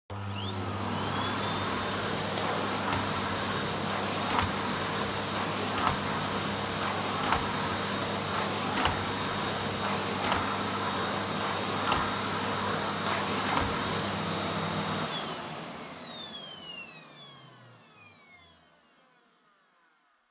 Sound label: Vacuum Cleaner Vacuum Floor Soft Mid; 掃除機; バキューム 床 ソフト 中